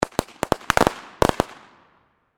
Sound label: Explosion Firecracker Multiple Distant; Fireworks